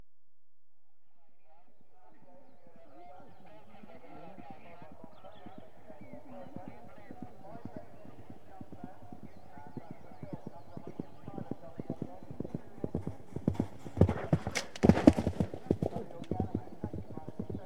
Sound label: Point TO Point Racing, Two Horses, Equestrian Events; BBC Sound Effects Library